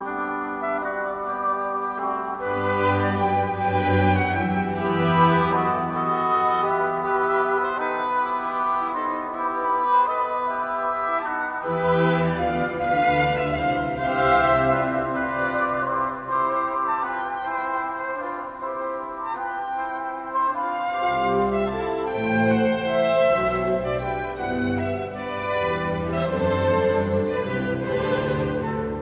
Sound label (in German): Weihnachtsoratorium BWV 248 Hirtenmusik Sinfonie